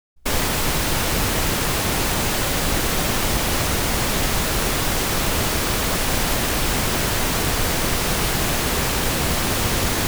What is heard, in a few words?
pink noise right channel